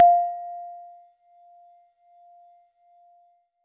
Pristine Celeste DX100 F4

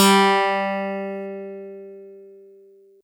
Harpsi FM DX100 G3

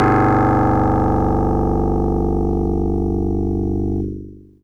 Harpsichord DX100 G0

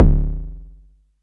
BD

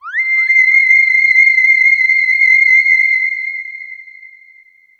theremin C5

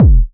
KICK

